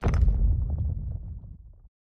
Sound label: click spin; games; Aztec Gold Megaways